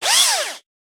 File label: Drone; rabbids multiverse; iOS, macOS, Mobile